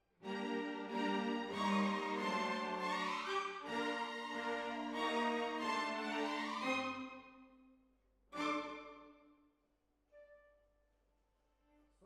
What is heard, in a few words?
Hall